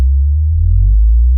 Shield Generator Emitter Loop

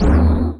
Shield Generator Hit

